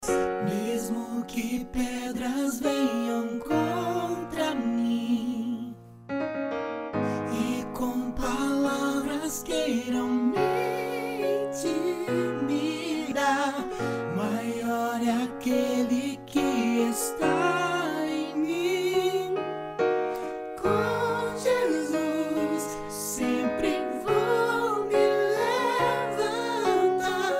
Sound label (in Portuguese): baritono e mezzo